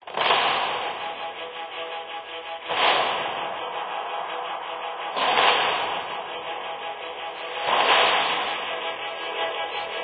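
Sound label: Music Ambient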